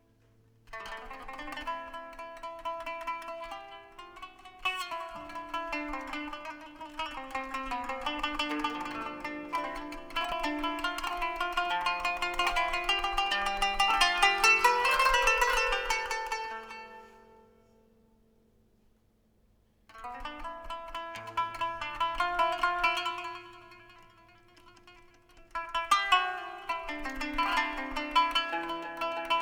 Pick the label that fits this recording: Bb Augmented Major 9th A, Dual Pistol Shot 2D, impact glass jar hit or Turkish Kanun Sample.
Turkish Kanun Sample